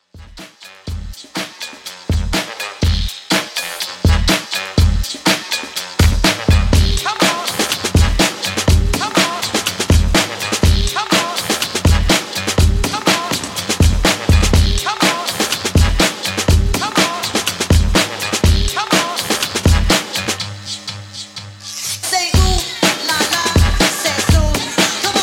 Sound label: 90s Redrum 123 Bpm